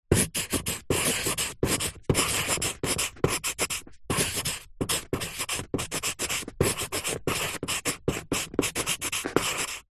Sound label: office pencil artists thick writing card